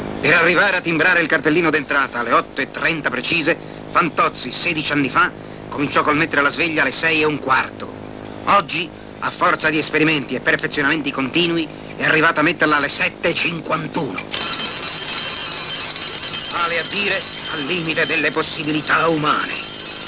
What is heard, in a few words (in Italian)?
sveglia; umorismo; paolo villaggio